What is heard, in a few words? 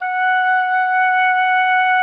F#5, OBOE; Xsample VOL-6